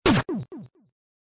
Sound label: combo